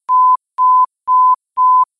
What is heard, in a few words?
panic button